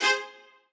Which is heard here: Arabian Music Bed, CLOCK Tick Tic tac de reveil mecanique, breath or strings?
strings